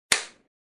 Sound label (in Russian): kinohlopushka